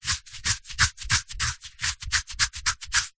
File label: itch scratch skin hairy; human